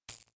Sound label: Default Spark; Ricochet